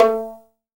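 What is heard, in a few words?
violin pizzicato A 2; AKAI S-Series CD-ROM Sound Library VOL-7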